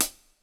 STEREO DRUMS

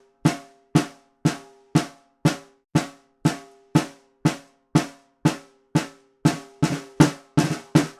Vintage Ludwig Snare, Percussion; Appalachian; Continental Drift Aftershocks

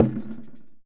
KOGUMO, KOREAN DRUMS; AKAI S6000 CD-ROM; WorldSounds

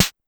Snare, Pitched Down; Clavia DDrum-AT

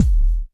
BD, Bassdrums, Roland TD-20

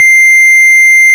ORG Farfisa

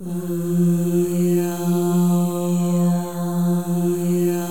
MOVING V1, Symphony of Voices; Spectrasonics

